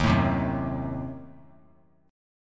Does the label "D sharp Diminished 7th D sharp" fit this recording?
Yes